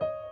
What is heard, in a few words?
piano high